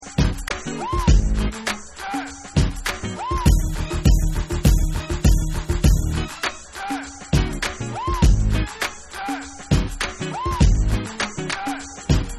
Original Instrumental

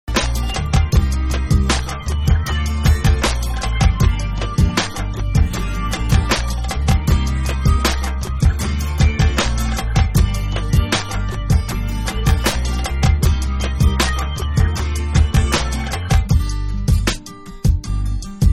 Instrumental

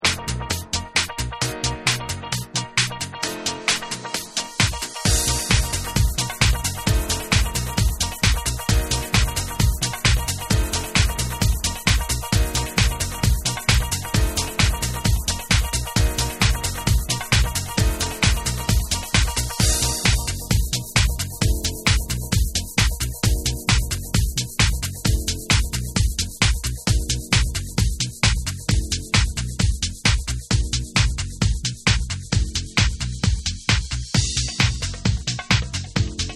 Retro House Instrumental

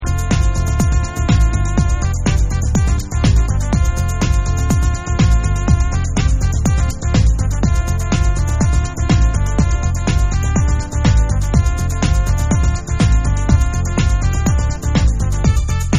Trumpet Mix

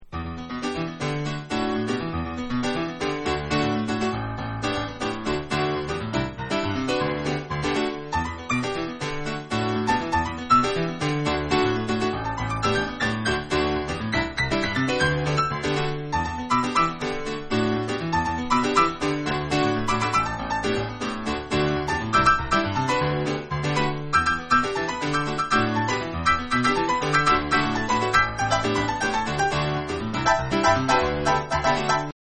PIANO

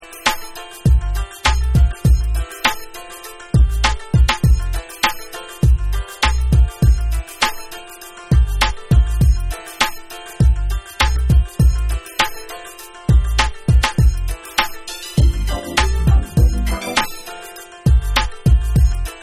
Instrumental

